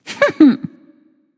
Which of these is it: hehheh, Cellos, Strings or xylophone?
hehheh